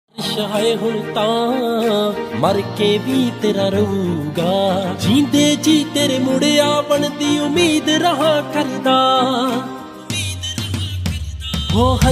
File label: Punjabi Song Ringtone